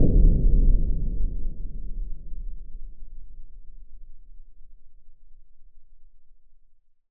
Impact Boom; bottlerocket

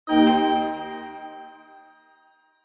game sound reveal magical

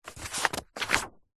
household book wordsearch magazine page turn; zapsplat

